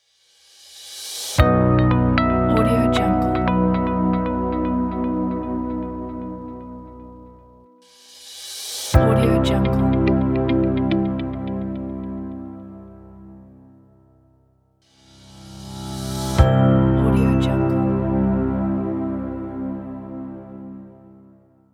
corporate logo pack

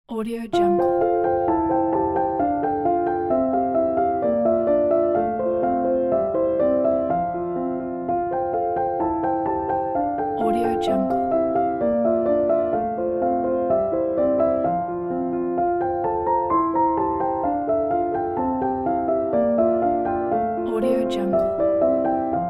inspiring love

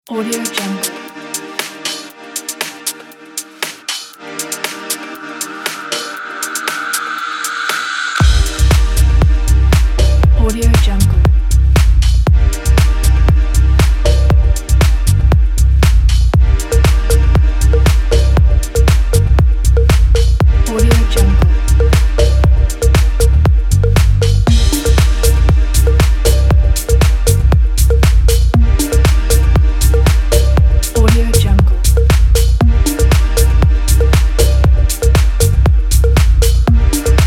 abstract podium house

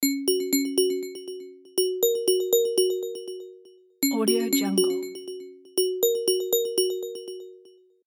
incoming video chat

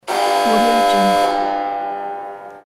factory machinery alarm warning sound; vintervarg

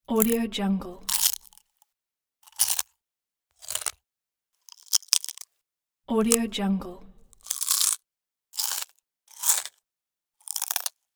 bite food

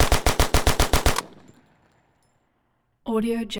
gun shot grease gun continuous fire bullet drop

